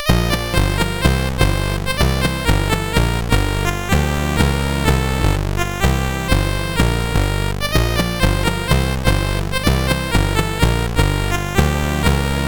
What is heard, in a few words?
Conga, CSG8580R5